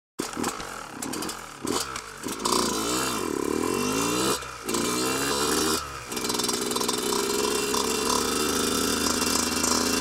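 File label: moped edet, moped riding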